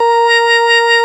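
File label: Organ Vox A#4, Continental, Vox Wah Bar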